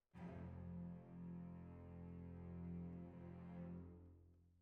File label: Flute